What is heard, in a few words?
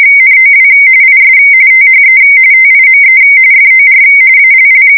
Sound Sample RTTY-45